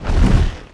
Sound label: wing, dactyl; Giants